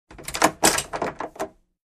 Door Hand levers

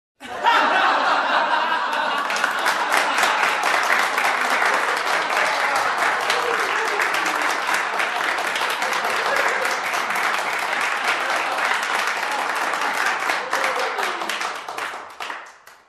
Audience in a close room laughs and claps

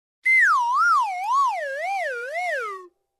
Comedy dizzy sound effect

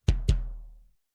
Two Kick Drum Hits

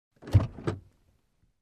Car Door Open

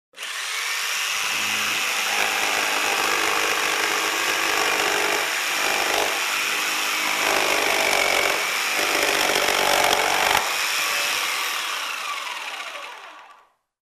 Electric Hand Cutter Cutting a Pipe

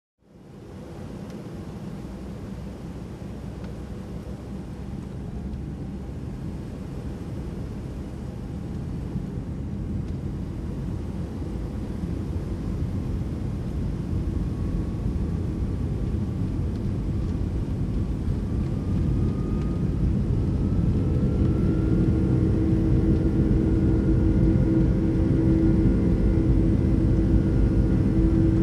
Airliner Cabin Ambience Ground Rolling

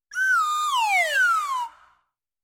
Funny whistle falling sound effect

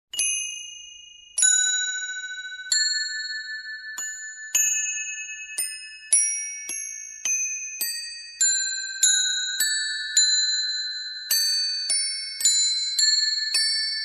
Inharmonic mallet melody

